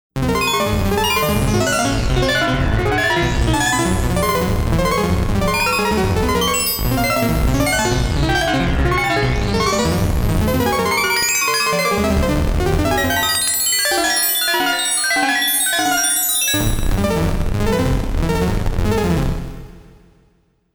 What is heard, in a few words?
Andromeda Arplet